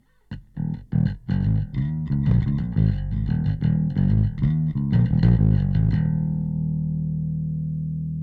bass E Bass; Neural Q

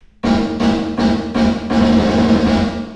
LATE REVERB Bright Plate

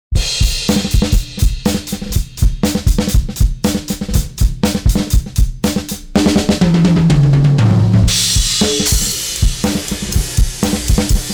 Drums SPREAD wide; 6dB; Kelvin

